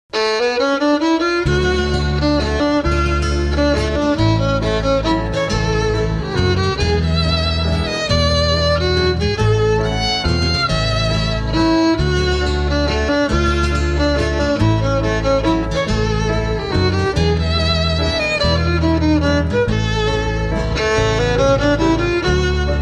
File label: candlelight waltz quadrille (instrumental)